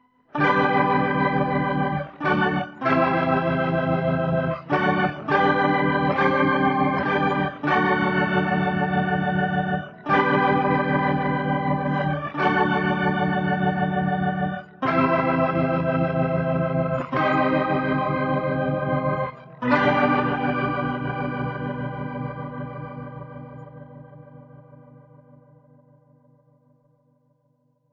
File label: Synth